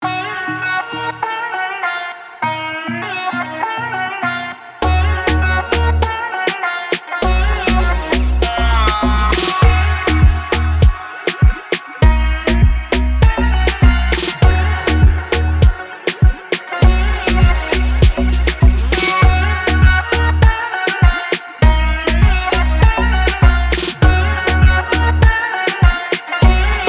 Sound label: Glitchy Sitar Rave